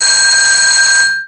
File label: classic phone; Philips; Multimedia Studio